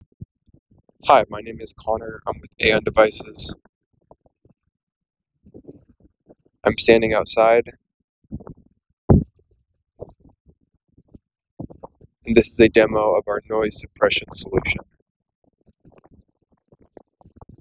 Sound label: wind output